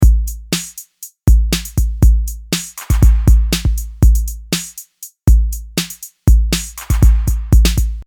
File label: loop; garageband